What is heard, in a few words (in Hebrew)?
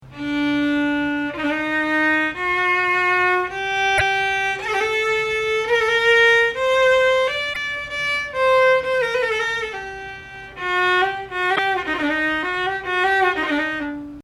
חיג'אז, מאקם ומוזיקה ערבית